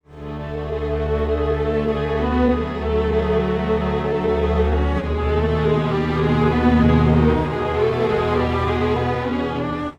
strings, timestretch; i3 dsp quattro x

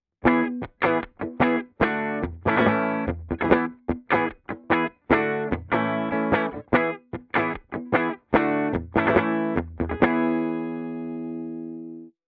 clean bridge rythm gibson; vintage

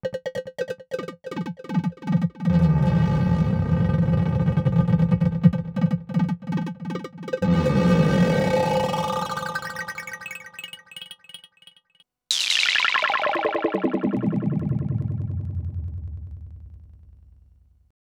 Waldorf Blofeld sfx

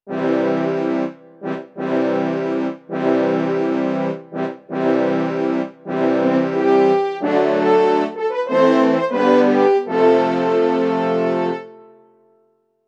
horns, waldorf blofeld

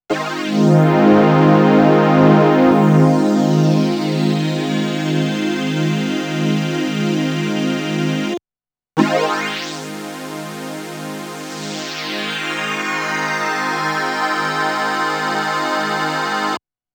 Waldorf Blofeld mideres open close comb

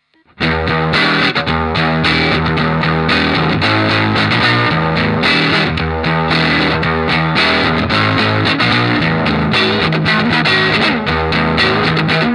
foxy; ik multimedia amplitube hendrix

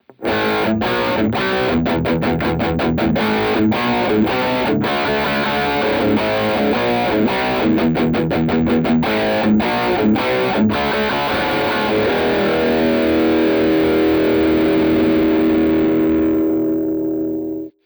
hard rock bridge; guitare; indie totalnatural

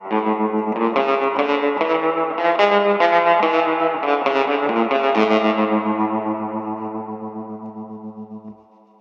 surf; native instruments; guitar rig

